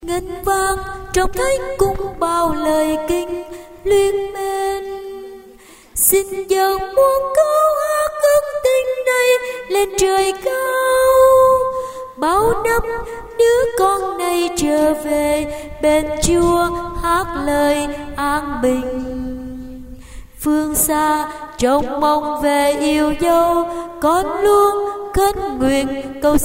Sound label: tenor basso